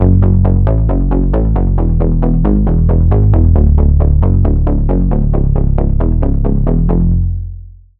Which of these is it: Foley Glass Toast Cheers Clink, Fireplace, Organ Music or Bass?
Bass